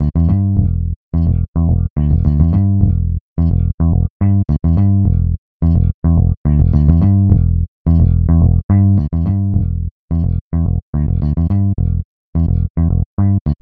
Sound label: Full Fingered wah